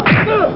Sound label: URGH; Demon